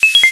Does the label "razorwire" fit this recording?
Yes